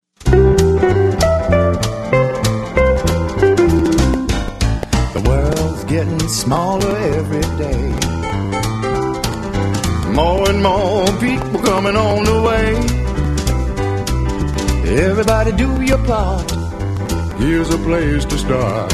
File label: SWING